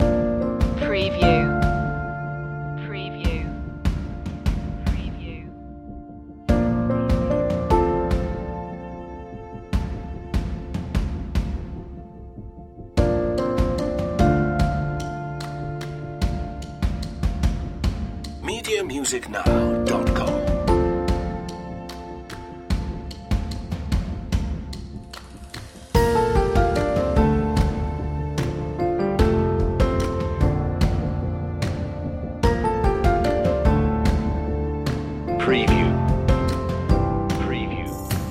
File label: planetarium loop